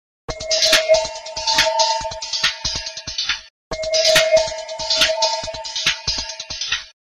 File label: Music Helium